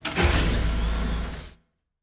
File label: Door, Sci-Fi Sounds